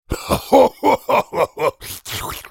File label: laugh; DrMundo